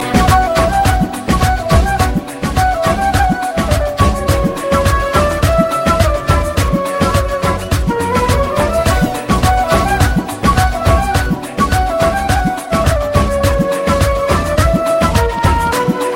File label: best flute ever tone instrumental ringtone